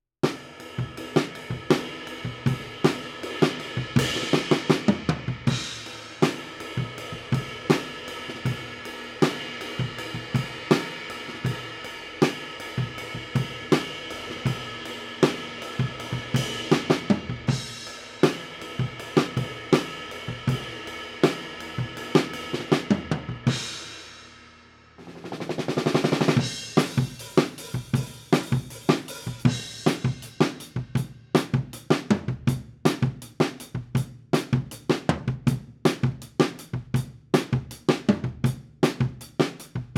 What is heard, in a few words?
Kit, 160 BPM